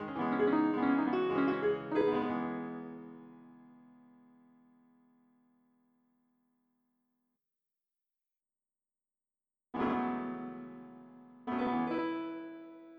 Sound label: piano